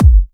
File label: Kick Beat Bass